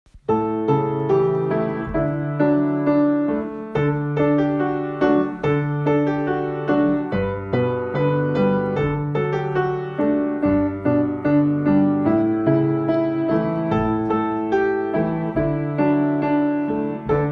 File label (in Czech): pampeliska klavir; S Puntíkem a Pepinou za vílou Poupěnkou; jaro